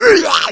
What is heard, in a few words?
smoker attack, voice; Left 4 Dead 2